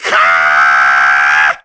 Sturgeon Scream

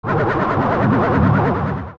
Swipe; Bongo Bongo; zelda; OOT

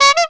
Map Close; Tingle; Zelda

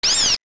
Keese Die

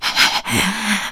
Breathe; Link breathing heavily (arrived in Lorule); zelda

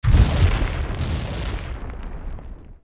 Wall Blow; OOT

